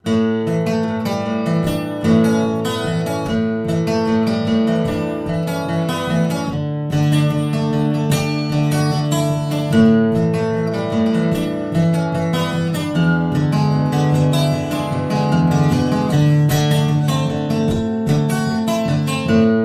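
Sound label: subway play for tips playlist guitar; The Sims 3; Windows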